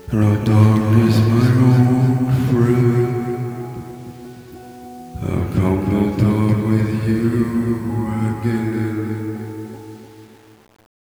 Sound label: sing; cyrax; monsters; sackrax